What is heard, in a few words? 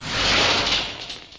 Machine Crash